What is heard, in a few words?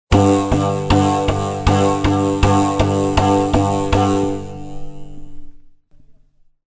ttunttun corde; instrument